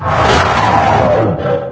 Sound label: claw; king